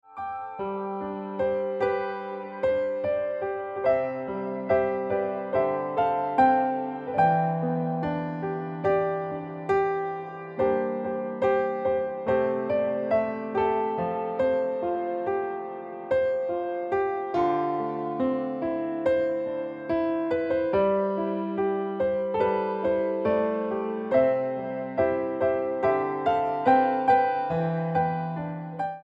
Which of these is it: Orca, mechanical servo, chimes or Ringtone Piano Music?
Ringtone Piano Music